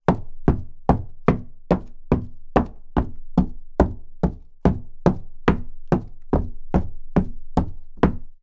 Footsteps Female - Wedges Wood Stomp

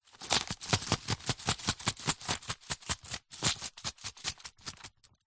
money coins shake in paper envelope

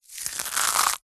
egg shell already broken crush crack in hand; zapsplat

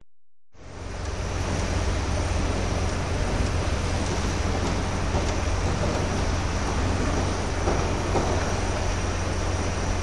Construction Ambience; audio hero

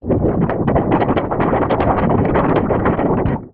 hospital xray sheet shake

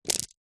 necklace small stones pebbles set down carpet

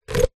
sport cone plastic stack others

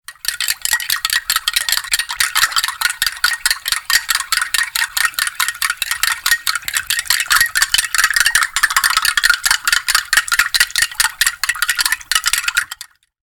food drink stirring liquid in cup; sound effects one

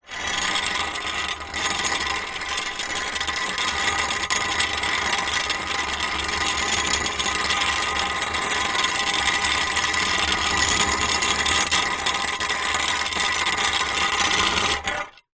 household hoe metal drag on concrete

